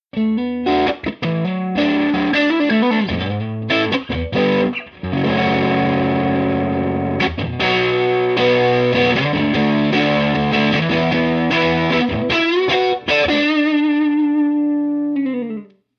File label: overdriven